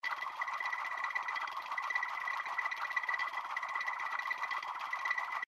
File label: Reel Spin, slots; Fortune of Olympus